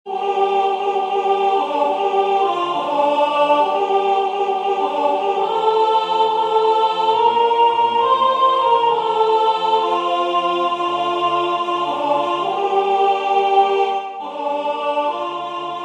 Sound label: Viens Esprit de saintete soprane